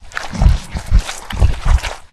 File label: boar eat; Soundscape Overhaul; monsters